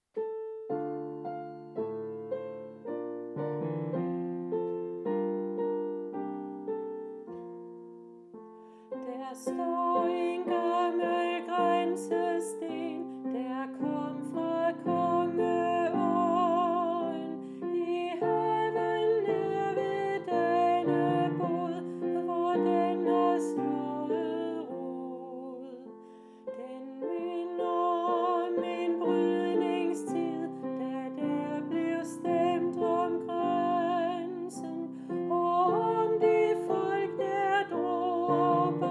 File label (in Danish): der staar en gammel graensesten alt